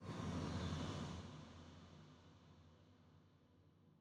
Mountain SFX, Rhythm Temple SFX Energy Crystal Fill